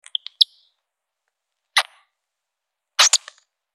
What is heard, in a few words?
Mouse Squeaks